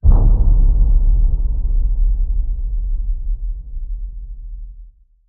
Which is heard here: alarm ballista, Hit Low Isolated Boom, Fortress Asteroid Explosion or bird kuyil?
Hit Low Isolated Boom